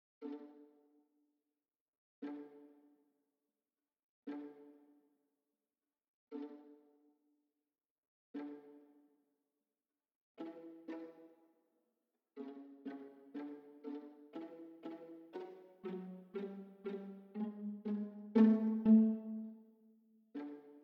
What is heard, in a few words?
Cellos; Nutcracker Suite